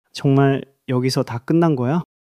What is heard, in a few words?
crying